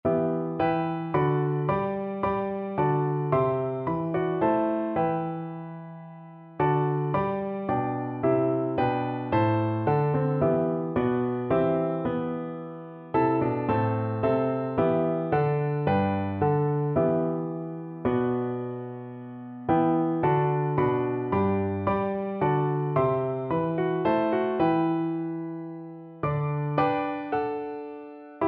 emmanuel, piano duet

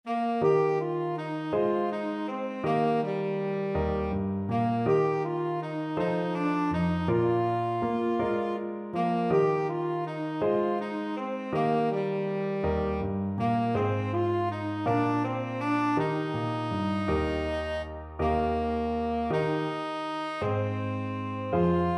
tenor saxophone

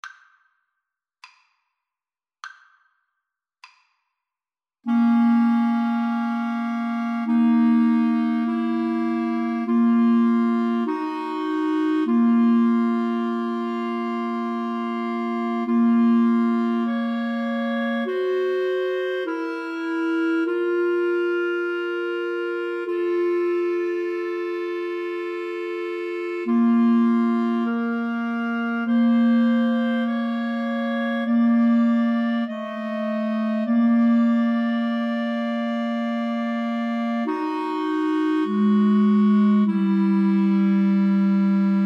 Clarinet Trio

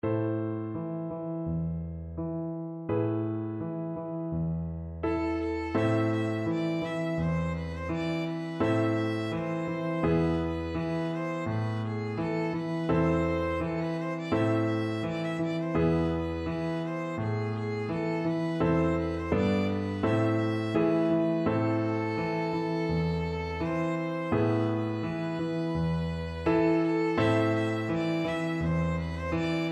Clarinet Violin Duet; Bernard de la Monnoye - Pat-a-Pan (with piano)

